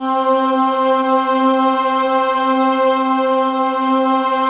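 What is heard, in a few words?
slow orchestra pad, Slow Pad 5th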